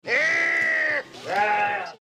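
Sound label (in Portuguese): grito eee aaa, scream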